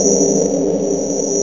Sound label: reverb